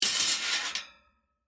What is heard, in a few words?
Vent Cover Remove, Industry, Vent, Cover, Removed, Metal, Slide; Machinery; BlastWave